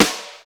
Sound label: SNR XC SNR; Club-50 - Foundations Roland; Cheez Snrs